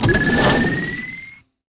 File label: Gun